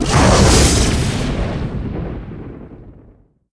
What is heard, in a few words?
lightning; wesnoth 1.12.2